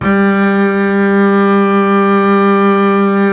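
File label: string contrabass G; Miroslav Vitous; Solo Instruments VOL-2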